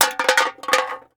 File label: Soda Can Fall; Crashes; 1001 Sound Effects